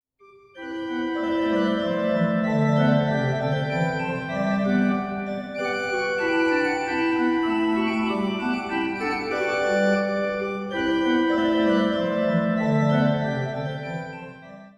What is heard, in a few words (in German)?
Rigaudon Suite C Dur, Orgel; Gottlieb Muffat; orgelweihe und orgelkonzerte in der malteserkirche